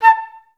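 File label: flute staccato A3; Best Service; Peter Siedlaczek's Advanced Orchestra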